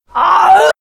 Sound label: regurgitate prepare, fox male